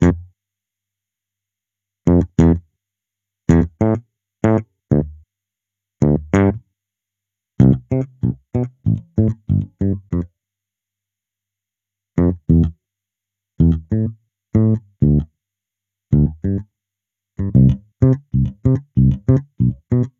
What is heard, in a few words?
Elec Bass; super crush k.o.